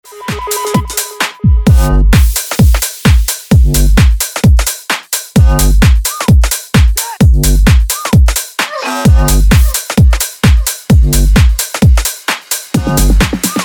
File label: tech house breaker 130 bpm